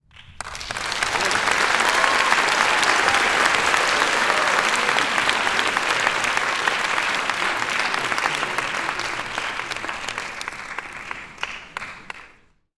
Cheering Indoor

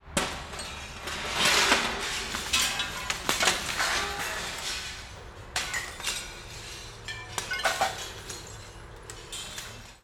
Breaking Window

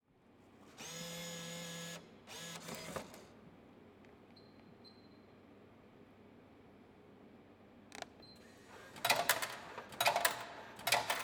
Coffee Vending Machine

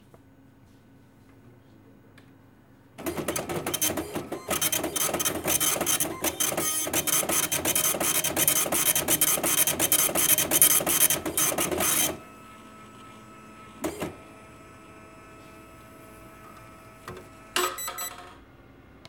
Printer

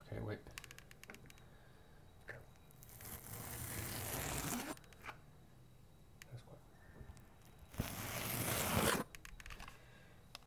Gammon Meat Slice

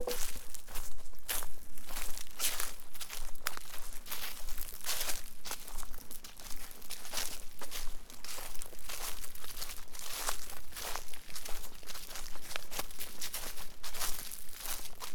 Walking On Leafs Forest

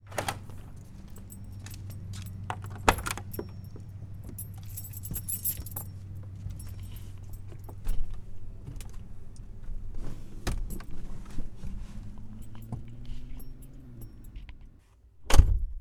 Car Interior Engine Start

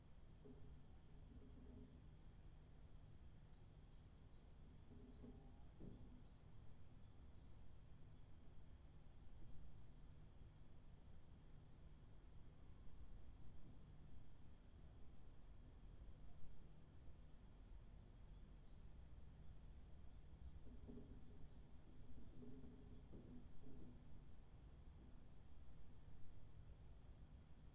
Roomtone Empty classroom

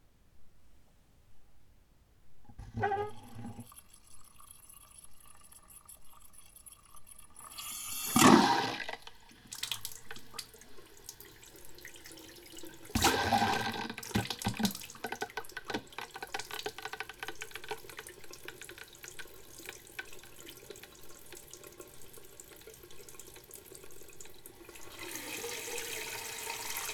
Water Is Back Sink With Hiccup

